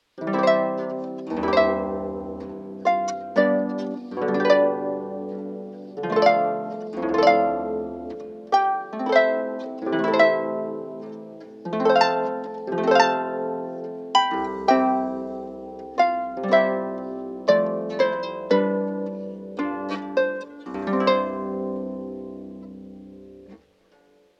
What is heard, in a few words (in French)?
harpe; cs2000